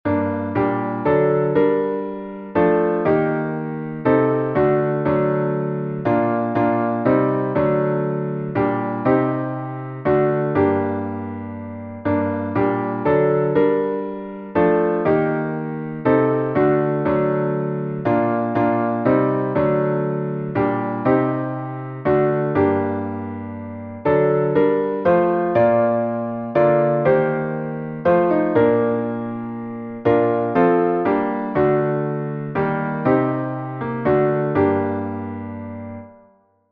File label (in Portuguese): salmo instrumental